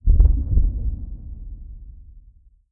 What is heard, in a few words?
dimensional horror portal thunder; Stellaris; guardians